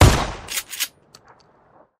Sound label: Gun Sound; Gangstar; Gully Loops